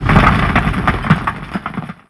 death; freak fortress 2; scp173

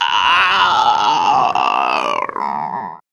female zombie idle; nmrih; zerosiki; slender

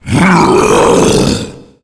tank climb, growl

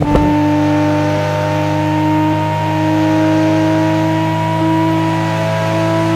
fourth, vehicles; crskautos

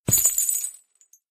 footstep; chriswalker; slender

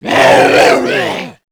kid zombie alert; nmrih; childrenofthedead; zerosiki; slender

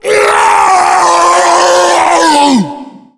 tank fire, voice pain

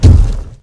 forest keeper step; lethalcompany; zerosiki; slender

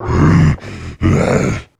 alert, male zombie; nmrih; zerosiki; slender

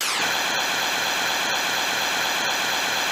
shell blue fly; tf2ware ultimate; mariokart

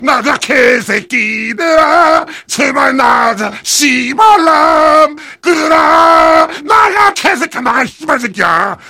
heavy singing

